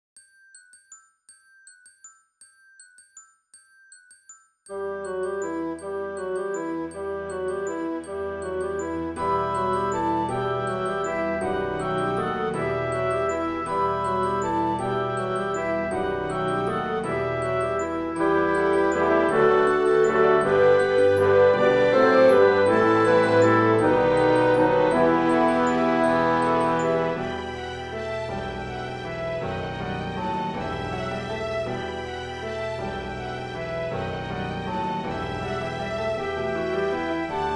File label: We Three Kings Carol the Bells Orchestration, Symphonic Orchestra